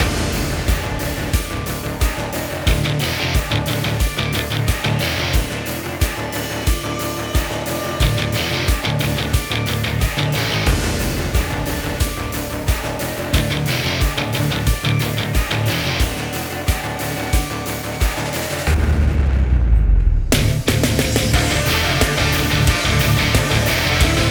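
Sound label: Master, Neue Deutsche Härte, 90bpm; Stems für eine DAW (90bpm)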